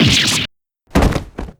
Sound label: faint